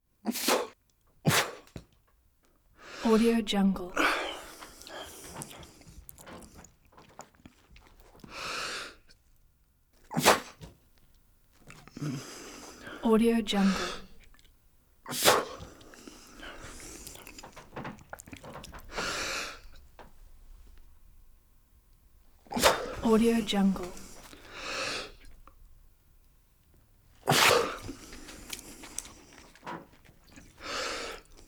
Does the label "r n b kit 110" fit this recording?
No